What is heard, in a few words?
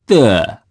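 Vox Landing, Japanese; Ezekiel; kingsraid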